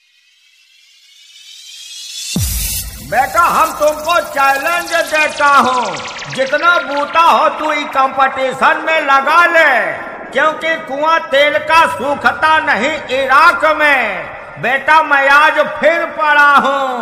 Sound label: Competition Challenge Sound Check Beat